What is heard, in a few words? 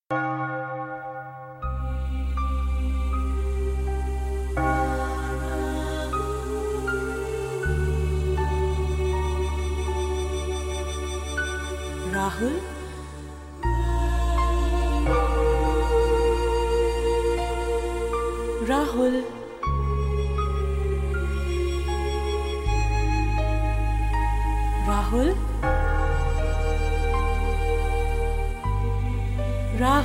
Theme Piece